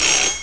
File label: ringring; Amiga Soundtracker Sample Packs